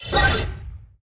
Soul teleport out

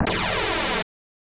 Beam

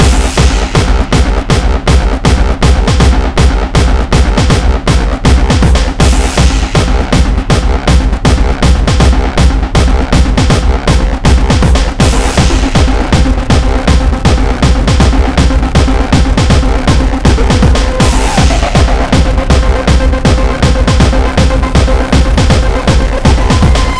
Beats Loop; Anger Foot

